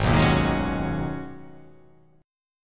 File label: G sharp Minor 11th G sharp, chord